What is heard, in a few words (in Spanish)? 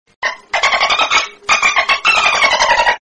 delfin silbido